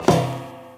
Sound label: metal grate, footsteps